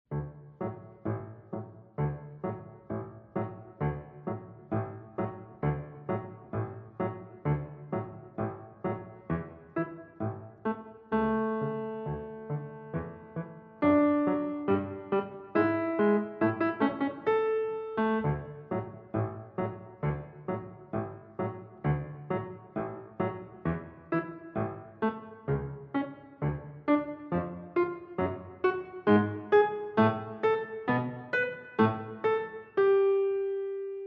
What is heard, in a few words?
Dance of the Reed Flutes secondo